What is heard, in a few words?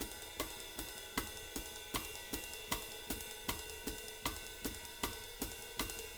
310 BEBOP, Living Drums